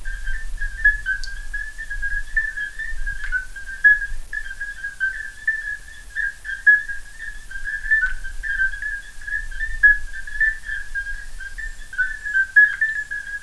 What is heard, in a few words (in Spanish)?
Ejemplo de sonido, Ranas y Sapos de España y Portugal; Guía Sonora de las Ranas y Sapos de España y Portugal (2ª Edición, 2004); Fonoteca Zoológica